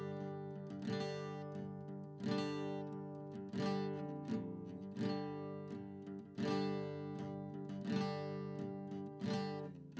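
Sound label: no drums, instantaneous; SiSEC11; underdetermined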